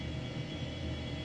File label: loop machinery short